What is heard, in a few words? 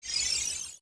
floating out; navi